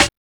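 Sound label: snare drum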